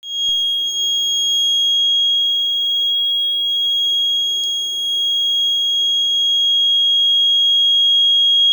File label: alarm